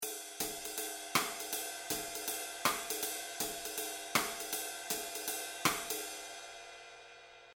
クローズド リムショット

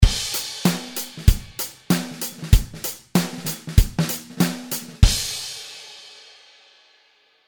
スネアゴースト

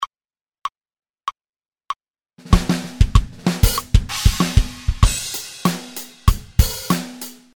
キック

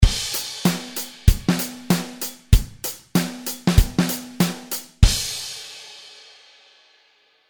スネア アクセント

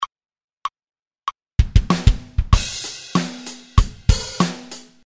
キック

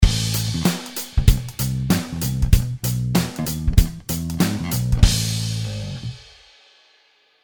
16 キック ダブル

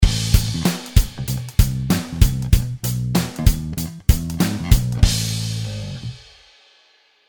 16 キック ずらし

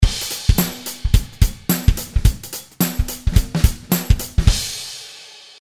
タンバリン スネア

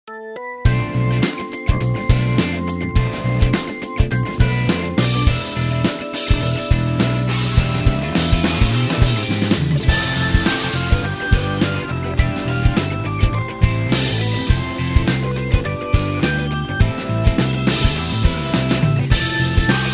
盛り上げテクニック

